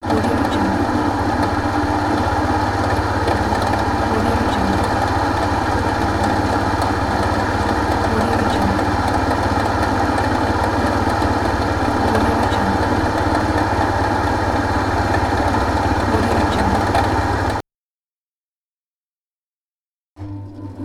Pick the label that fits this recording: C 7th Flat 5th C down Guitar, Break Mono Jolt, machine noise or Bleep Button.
machine noise